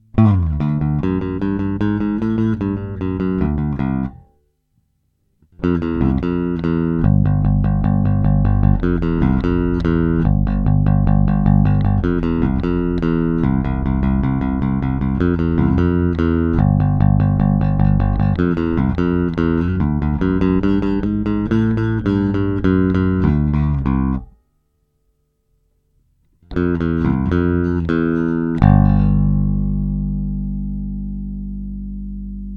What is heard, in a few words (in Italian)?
entrambi plettro aperto